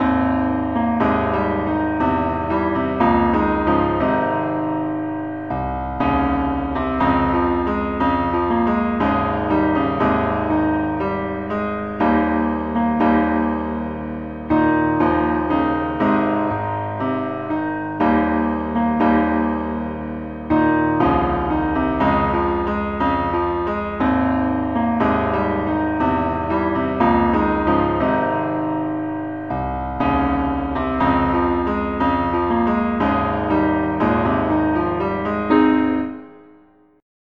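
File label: keyboard Only key C sharp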